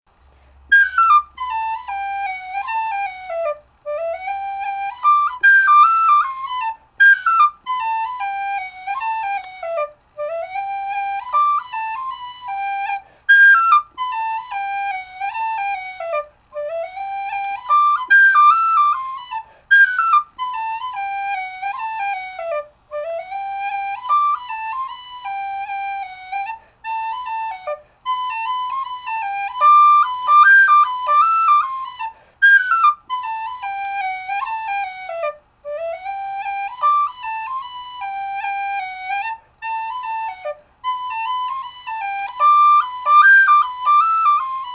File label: Hornpipe